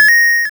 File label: coin big; baconthulhu